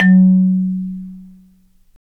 Marimba, -

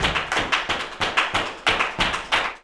flamenco tapping, jaleo

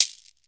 SHAKER, Heart of Africa; Spectrasonics

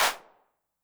HAND CLAP, 909 Reverb

